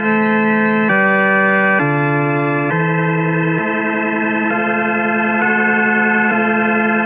organ origin; Loops; Electronic

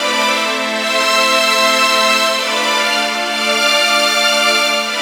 strings, Loops, Sad

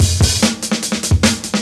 Drum loops Boombap

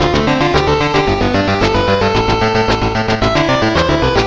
mocking tone; Loops; Electronic

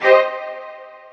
stroke, String

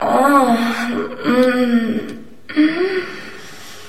female orgasm

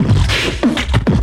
blap, Beatbox, Drum loops

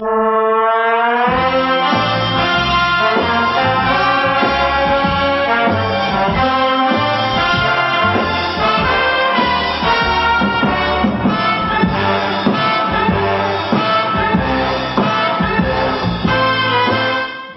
stripper song

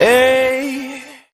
ay, sung; Voice; ooh ahh

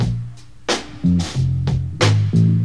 down home gal, Drum loops Boombap

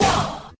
woah

